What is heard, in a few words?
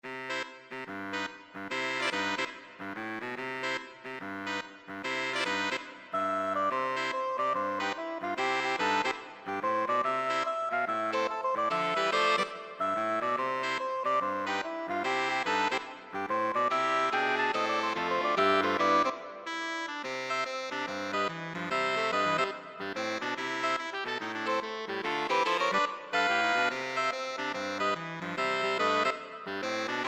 sax, jazz saxophone quartet; Turkey in the straw